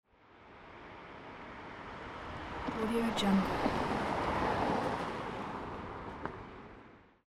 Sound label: car pass by